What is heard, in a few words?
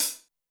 hihat, Zoom MRT-3B